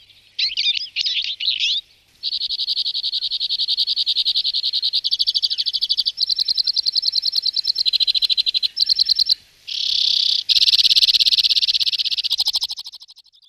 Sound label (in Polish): czeczotka - tryle